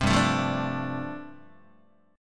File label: G 7th Suspended 2nd Suspended 4th G chord, Guitar; G7sus2sus4 strummed